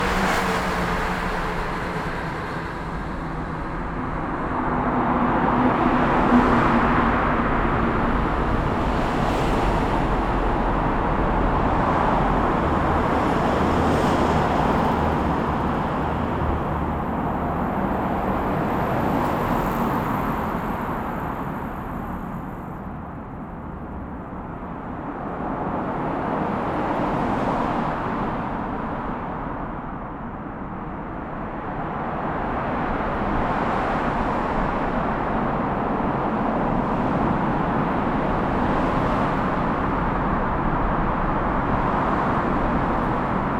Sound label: CAR ZOOM